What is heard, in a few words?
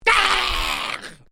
Created Creature Small Cry, Alien Sound Effects; Created creatures, vocalisations and pretend speech